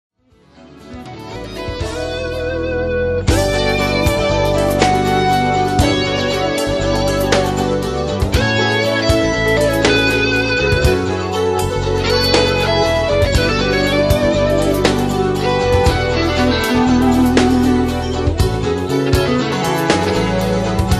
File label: gtr solo